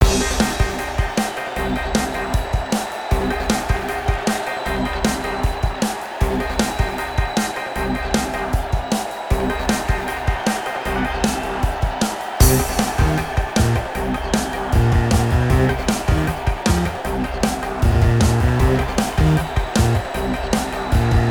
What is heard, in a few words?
Racing Menu (with Crowd); gamerip; Skylanders 5 SuperChargers; Pandergast